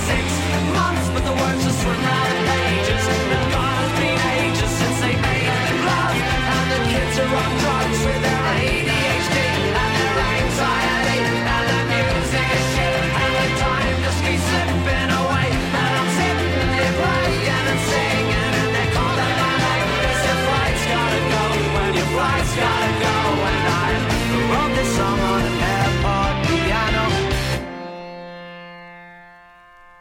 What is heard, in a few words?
Airport Piano